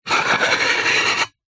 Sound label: household pot lid clay or ceramic lid turn rotate spin on pot